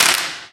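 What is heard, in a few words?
physics clipboard impact small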